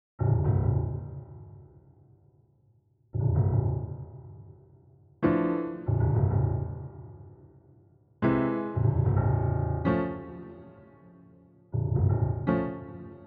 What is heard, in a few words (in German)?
Gewitterstimmung, klavier